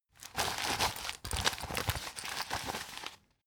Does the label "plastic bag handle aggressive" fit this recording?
Yes